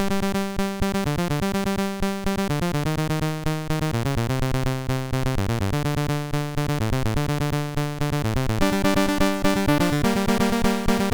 Loader Remix, MOS6581R2 sid

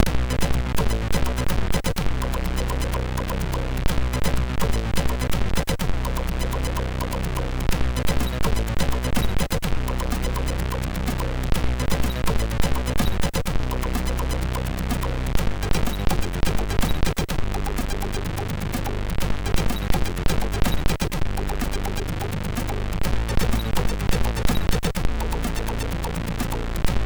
Bass Destruction, sid MOS6581R3

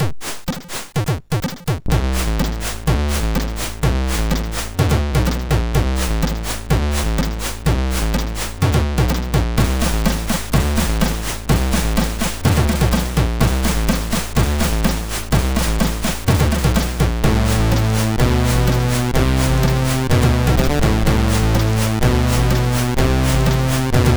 Servo Smooth, MOS6581R2

